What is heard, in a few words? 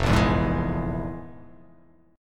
F Minor Major 7th Double Flat 5th, chord